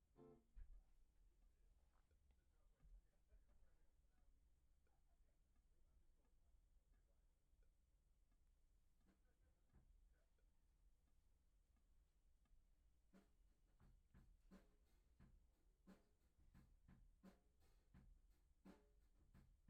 Act Guit R

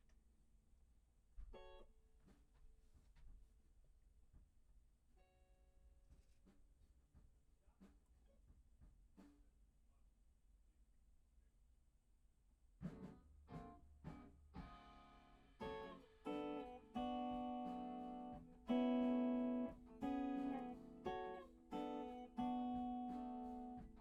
Act Guit L

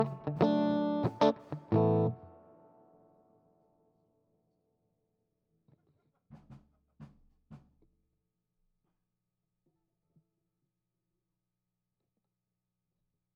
GTR R84

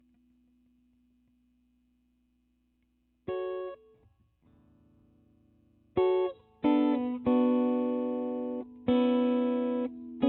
GTR R84

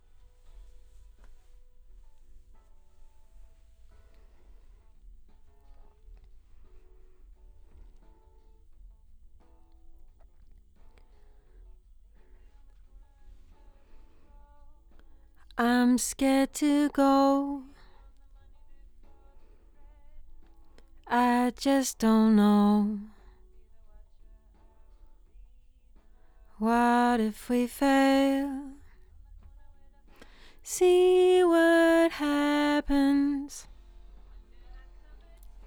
LdVOX Double

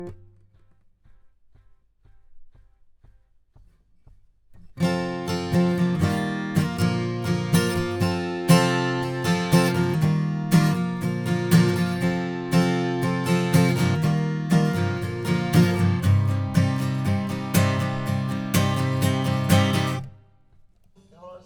Ac GTR

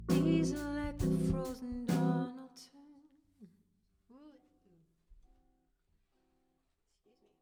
Rough Mix

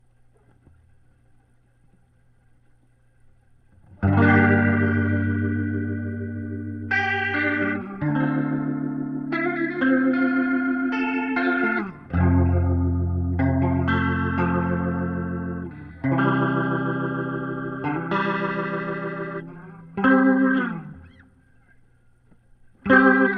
Leslie gtr

